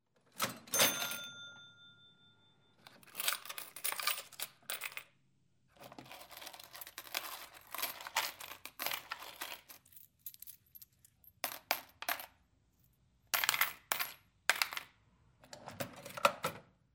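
sound with little bell, counting change and closing cash register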